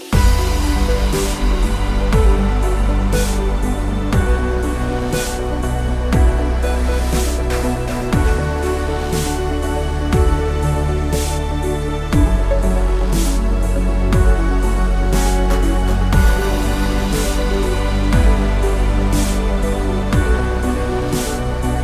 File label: Moog